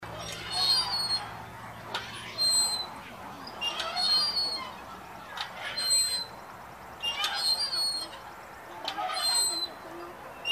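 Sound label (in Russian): три качели на детской площадке; Звук качелей раскачивающихся на ветру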